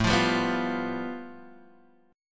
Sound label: A 7th Suspended 2nd Sharp 5th A Guitar Open D, chord